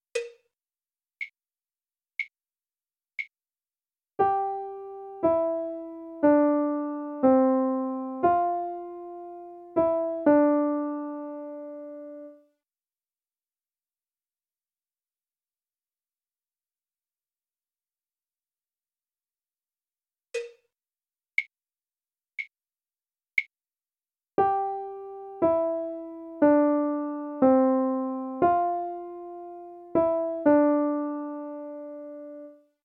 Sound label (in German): Melodie